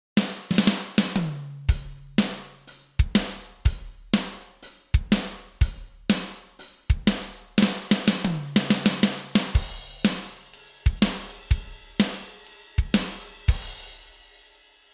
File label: drum instrumental